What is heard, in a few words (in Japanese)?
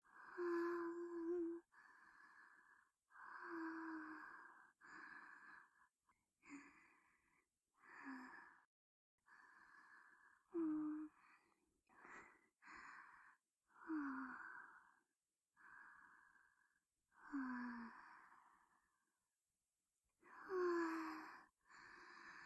寝息（右添い寝っぽいの）